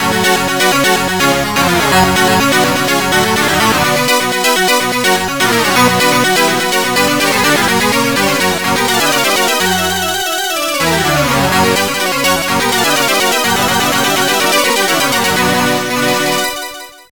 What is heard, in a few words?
game music, mod; coma favorites modland